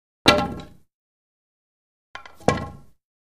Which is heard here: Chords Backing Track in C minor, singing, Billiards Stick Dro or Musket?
Billiards Stick Dro